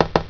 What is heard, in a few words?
turn